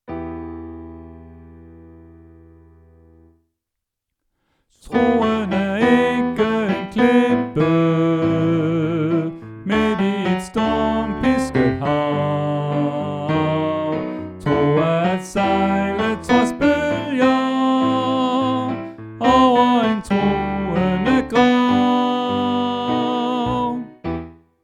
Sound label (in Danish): Tenor; oevefiler